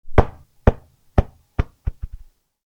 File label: ball bounce